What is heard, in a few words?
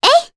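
Jump, Japanese; voices; heroes; Ophelia; kingsraid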